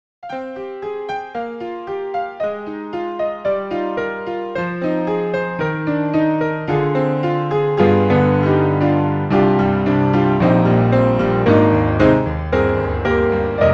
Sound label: Piano; 4FRONT